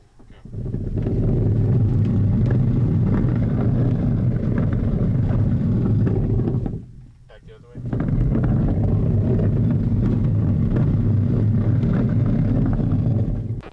Low rumble sound

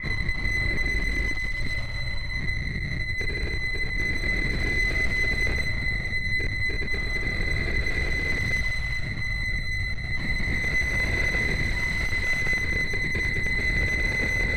Terror

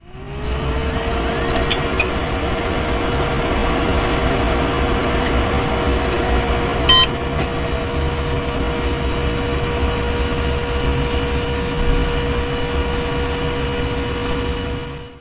Computer boot up

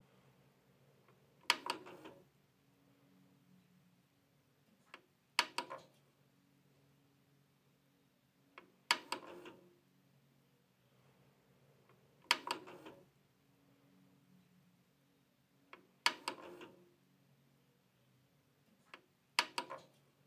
Vhs Machine Turing On And Off

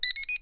RC Transmitter Start Up Beeps

